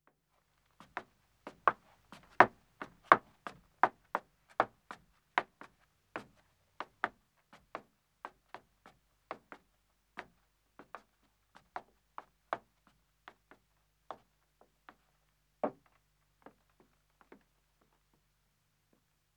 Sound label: Wooden Stairs Ascending, 2 Wom, Footsteps; BBC Sound Effects Library; Footsteps II